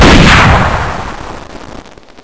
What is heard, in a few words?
gun